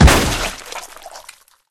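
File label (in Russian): жуткий чудовищный пушечный грохот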